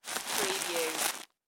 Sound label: Bread Wrapper Close; sound-effects-bible